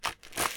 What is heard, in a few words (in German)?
ritsch ratsch